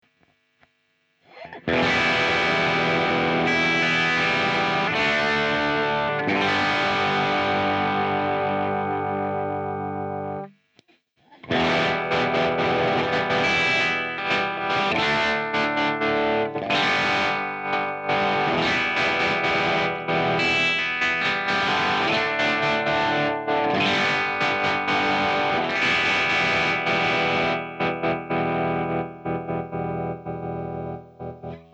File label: distortion